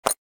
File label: SFX Gem Collected